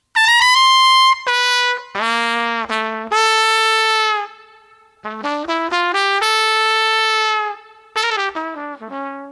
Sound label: trumpet